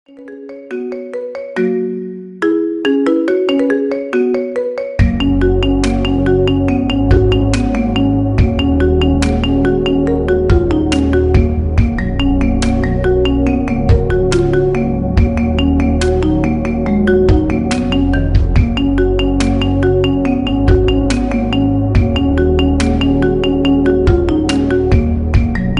marimba ringtone remix

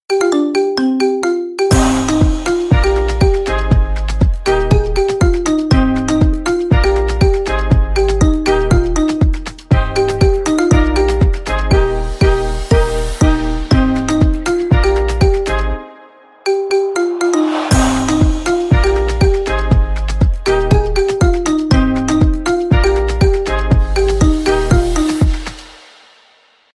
marimba remix

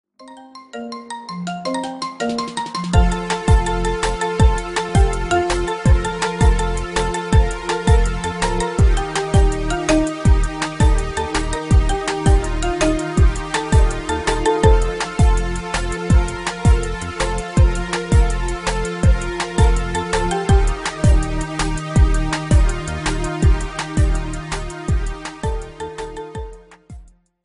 marimba ringtone remix

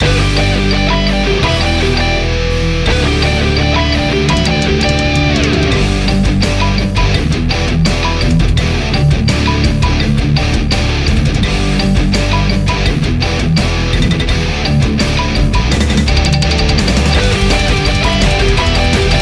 marimba heavy metal rock instrumental

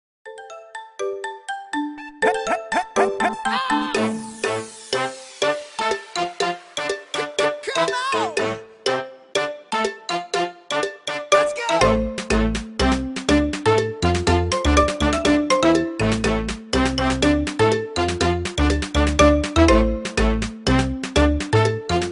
marimba ringtone remix

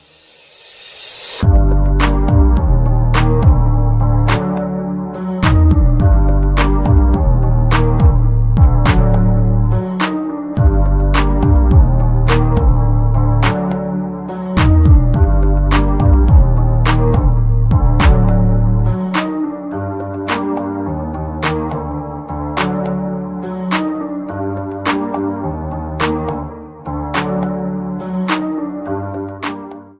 instrumental

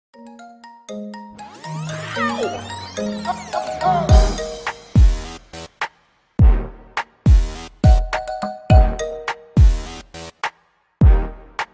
marimba ringtone remix

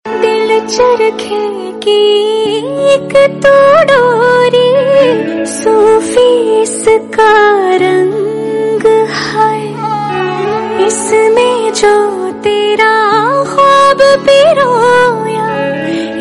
melody

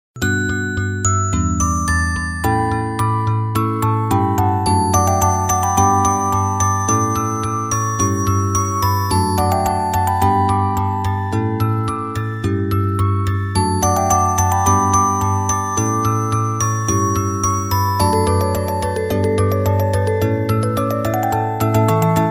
music box; nausicaa

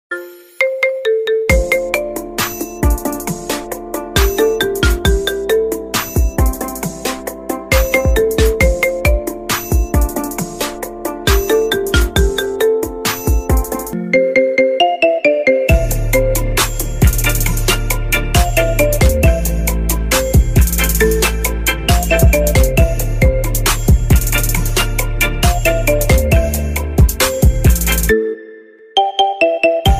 marimba remix

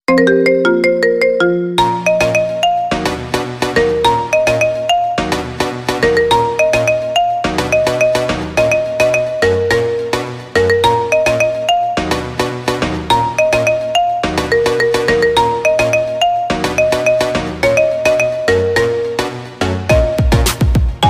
marimba remix

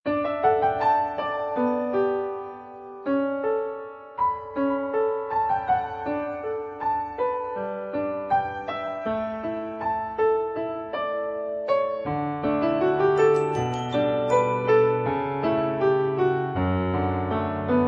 romantic

